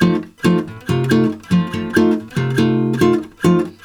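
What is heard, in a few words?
F 7 guitar, Brazil Bossa; USB Soundscan; AKAI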